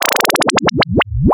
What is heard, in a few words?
Game Over, Video Game Sounds; 1001 Sound Effects